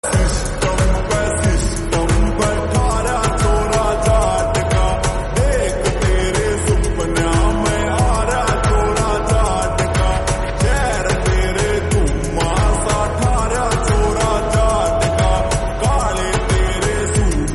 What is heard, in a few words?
Song Ringtone